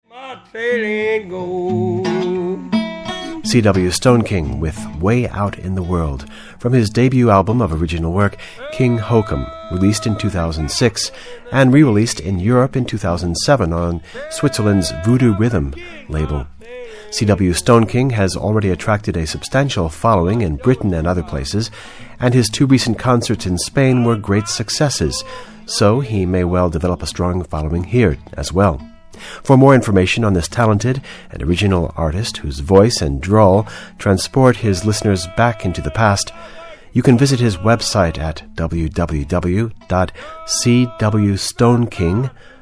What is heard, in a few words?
Blues Band; new blues band's first visit to Spain